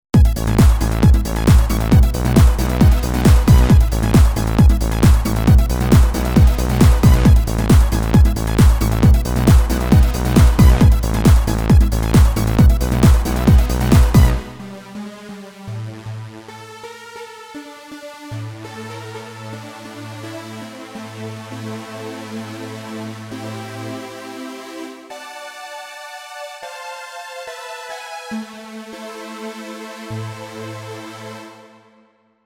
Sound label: Trance